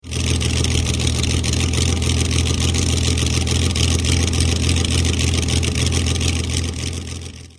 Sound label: ferrari idle